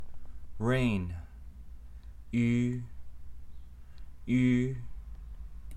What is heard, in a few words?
rain; Weather